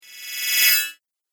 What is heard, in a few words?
Casting Spell, Magic Sound Effects